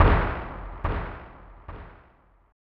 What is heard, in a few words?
Winning; SNES